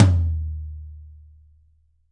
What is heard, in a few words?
roomy tom drum f key; tom drum samples; one shots